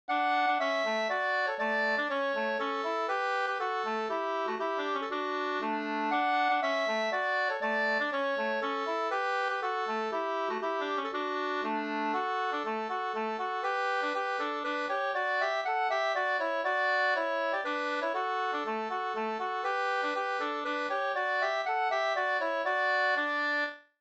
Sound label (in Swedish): polska fr Vastervik